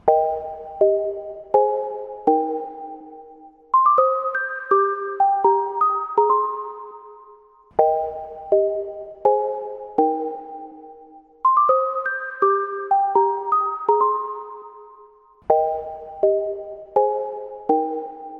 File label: classic ringtone; samsung j7